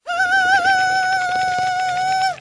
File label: Emote Scream Bandit